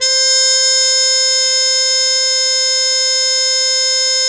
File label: MUTE C2, Mood Trumpet; E-MU Formula 4000 Series Vol. 1; Hip Hop Nation